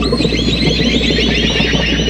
A#3 BIRD; Northstar - The Wizard VOL-1; WIZARD SYMPHONY

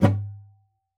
GUITAR FX; Xsample VOL-2

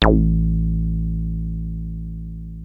303 A1, TB 303; Hollywood Edge; Giorgio Moroder Rare Synthesizer Collection

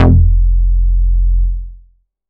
bass classic attack F; Polyend; ARC Noise

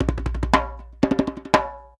120 JEMBE2

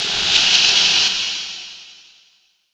noise, production effects

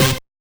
THIN CORE, RAVIN HITS; Spectrasonic Distorted Reality 1

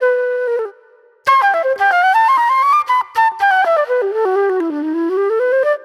Classic Soul Flute; Sonic Foundry (Sony Creative Software); Manhattan Flutes; Soul 1

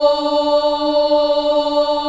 D3 CHI OH -R, Choir; USB Soundscan vol.28; Acoustic & Synth; AKAI

